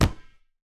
ball hit; fallguys

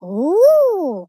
player victory; fallguys

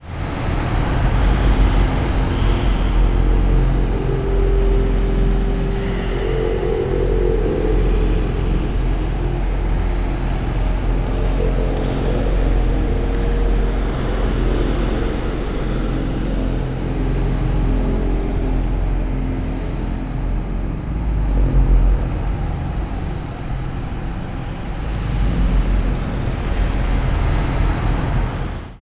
cathedral; ns marineris

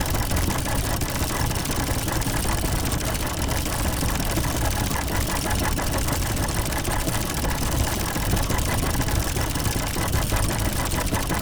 rhino idle; fallguys

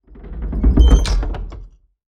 seesaw stop; fallguys

